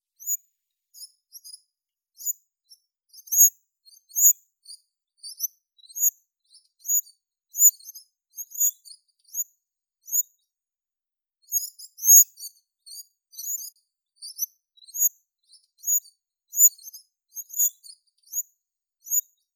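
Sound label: wren, Troglodytes troglodytes, chick; krapivnik; ptenets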